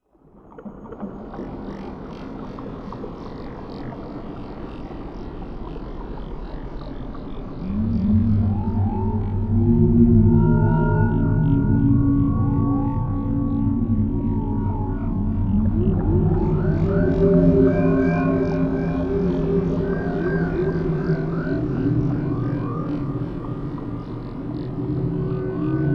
Mysterious Ambience

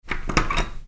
Interior Door Unlock

